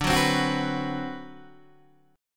D 13th D, down, Guitar, DADGAD

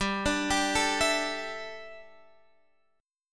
G 6th Suspended 2nd; G6sus2 arpeggiated

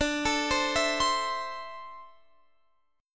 D 7th Suspended 2nd down Guitar Standard; D7sus2 arpeggiated

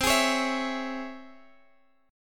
Db Minor Major 7th C

